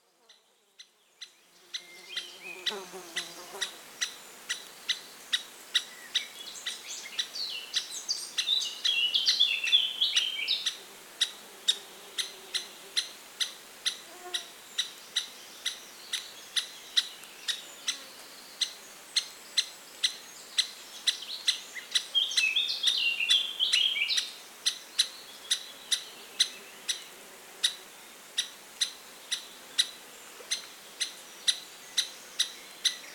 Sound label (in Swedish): mindre hackspett; fågelljud